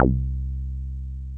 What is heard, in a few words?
TB303 TRI, ROLAND TB-303 BASS; Rubber Chicken Software Sample Bank